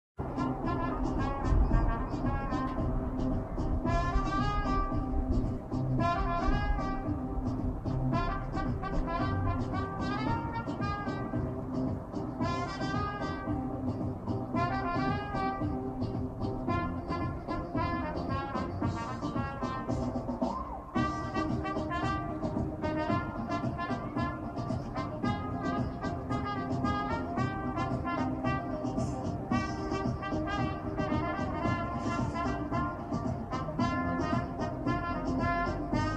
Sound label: trumpets